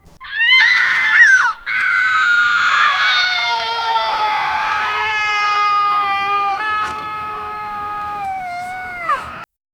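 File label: three young boys screaming; airplane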